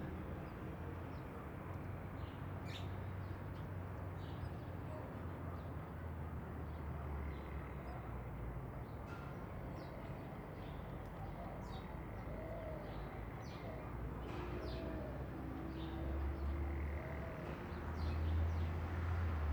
AMB EXT MANANA CEMENTERIO Tacuarembo UY Generico

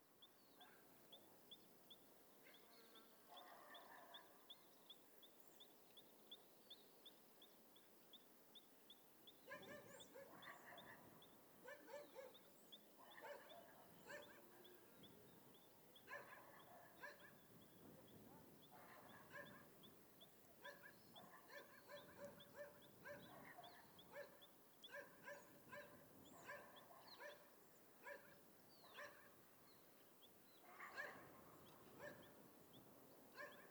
AMB EXT ATARDECER CAMPO PUEBLO Piedra Sola UY Generico pajaros perros moto pasando

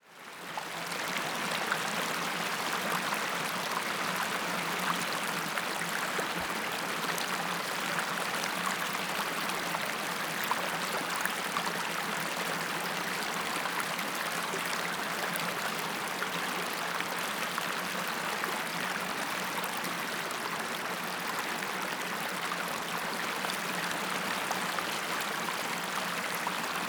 AMB EXT AMANECER ARROYO Valle Eden UY Generico

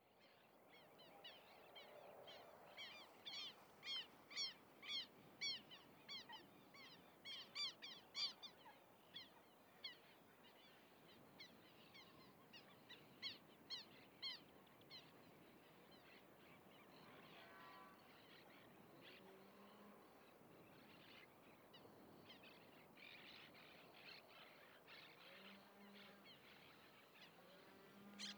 AMB EXT ATARDECER CAMPO Artigas UY Generico cotorras teros fondo carretera

